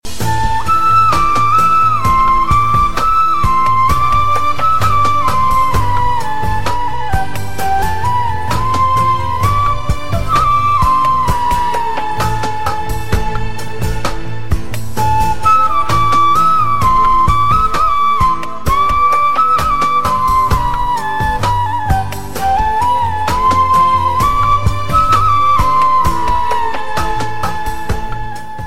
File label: Flute Mix